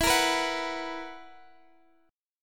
B Major 7th Suspended 4th, guitar chord

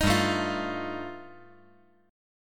G 7th Suspended 2nd Sharp 5th, G, chord; 8,7,5,8; down; 5G,4C,5E,5A

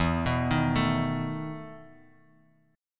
E Major 7th Suspended 4th E; arpeggiated; JGuitar

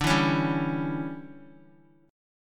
D Major 7th Suspended 2nd Suspended 4th D, Guitar; DM7sus2sus4; strummed; JGuitar

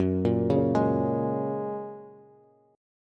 Gb Major Add 9th, down, Bass; arpeggiated; JGuitar

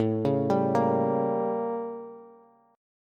A 7th Flat 9th Bass; A7b9 arpeggiated; JGuitar

